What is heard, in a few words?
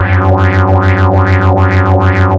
death; quake2; bunbun